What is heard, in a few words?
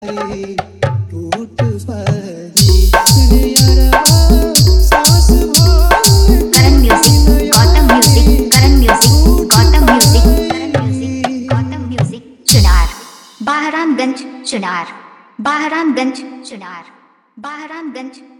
Freindship Song Jhan Jhan Bass Hard Bass Mix